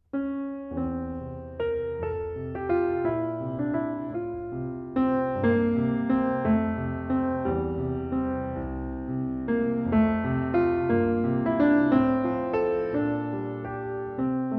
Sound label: Waltz in F